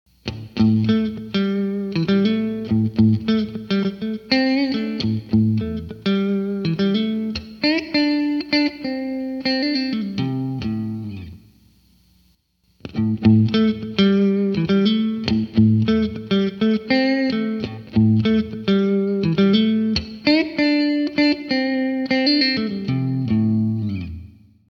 compressor

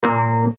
bass shifted up; dr who

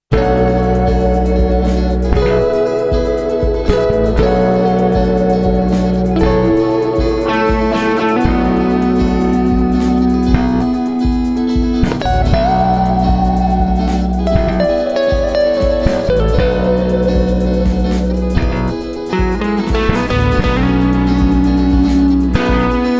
jazz fusion; effectrode

